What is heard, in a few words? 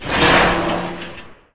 Door Rust Metal Open; Doors